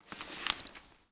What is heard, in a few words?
c4 timed ambient; Bf2remotes; Battlefield2 C4